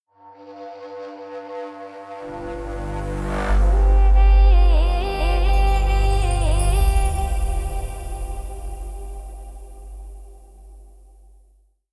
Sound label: Ethnic Logo